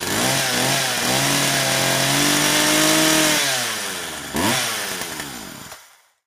CHAINSAW; chainsaws

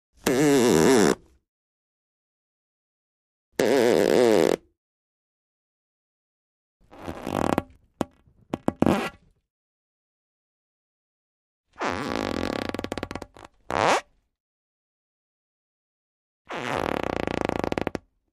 Skin Stretching Frict

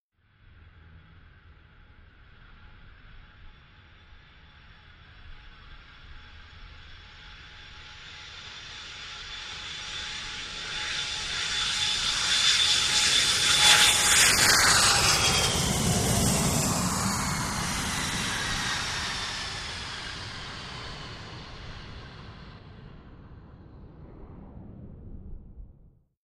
Lrg Jet Landing Touc

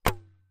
60MM MORT LAUNCH; mortars

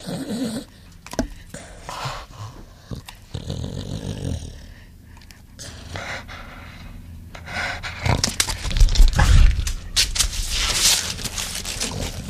Pit Bull Hvy Breath Sn

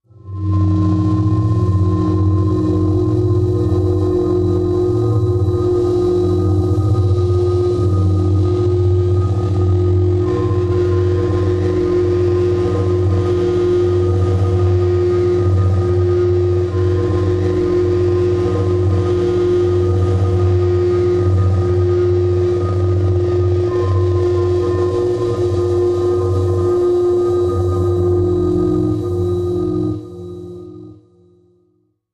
Lost Flight Low Atomic, energy beams

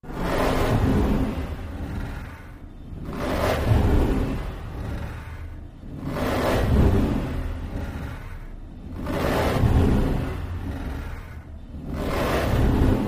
Monster Breath

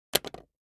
Mech Button Metal, switches

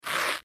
Quick Velcro Rips

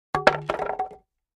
2x4 Plank Dropped On

